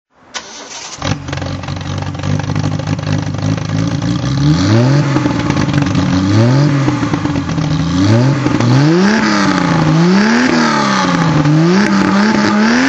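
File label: triumph tr6 pi rally throttle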